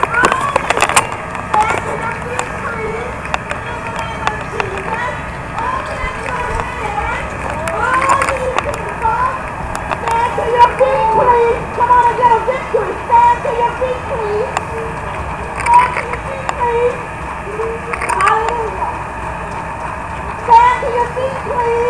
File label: whoa